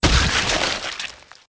disembowel, gore; Soldier Of Fortune II Double Helix; XBOX

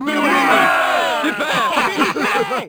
foul, Crowd; French

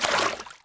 splash, footsteps

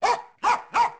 dog; Pirates Of The Caribbean; AMBIENT

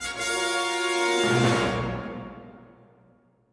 victory, music

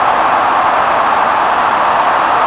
Large Crowd Aah; David Beckham Soccer; XBOX

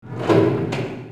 shops door; Tony Hawk's Project 8; XBOX

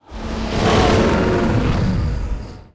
subway passing

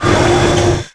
mech arm; Tony Hawk's Project 8; XBOX

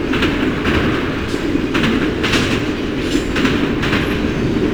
train, chicago

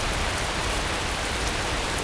med rain; ambient; Project Gotham Racing

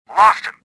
escaping; worker3

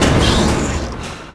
tram gears stop

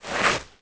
corp DRAG; The Elder Scrolls III Morrowind GOTY; XBOX

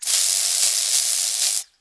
Spray 2; Weapons

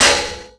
bmx metal grind on; terrains; Tony Hawk's Project 8; XBOX

